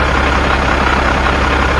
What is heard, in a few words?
vehicle; cs ~el~azteca v1b